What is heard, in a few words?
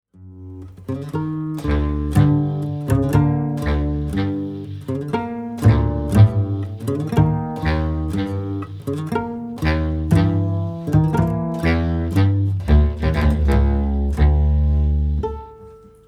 Tango